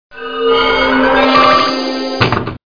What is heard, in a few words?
Ball Feed; Loony Labyrinth™ 1.02 Demo